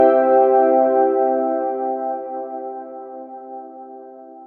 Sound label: correct